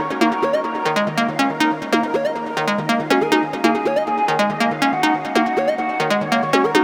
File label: Special Sounds Root D 140 Bpm